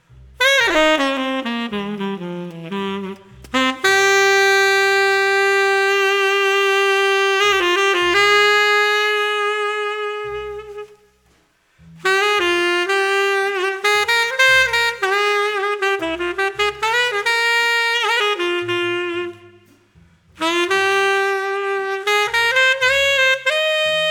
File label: Standard ballade jazz